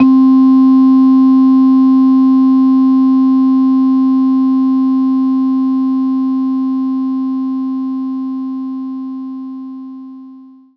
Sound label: Marimba C4